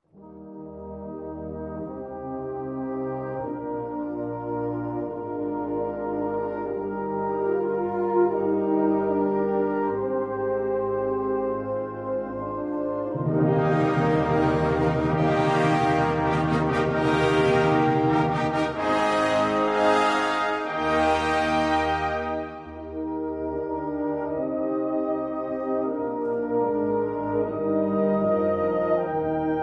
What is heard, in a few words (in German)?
Wachet auf ruft uns die Stimme, fanfare band